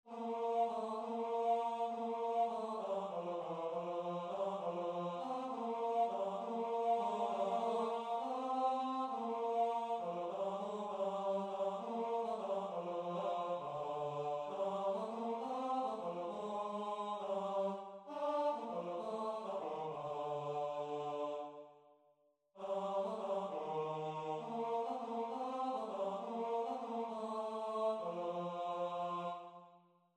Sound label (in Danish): tenor; Glade jul